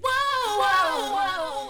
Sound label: VOC